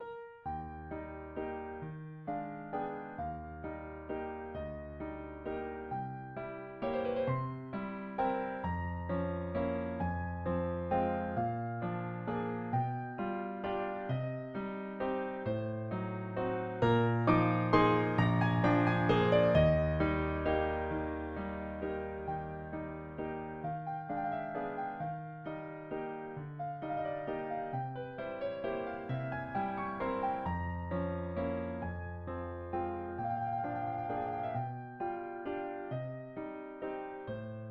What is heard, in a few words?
piano